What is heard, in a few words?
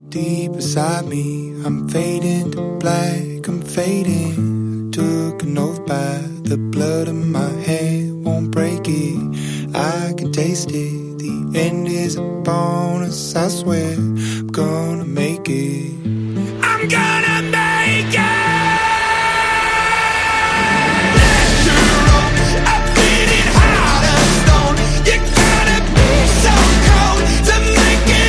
a beating heart